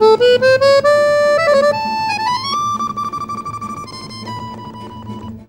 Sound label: ACC TRILLER; Best Service; World Colours